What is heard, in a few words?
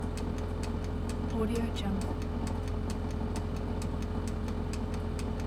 large portable electric oven loop